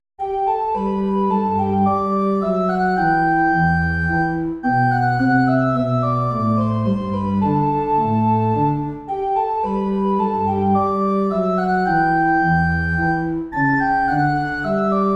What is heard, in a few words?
gavotte; haendel georg friedrich